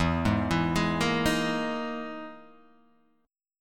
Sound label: E Major 7th Flat 5th down Guitar Half Step Down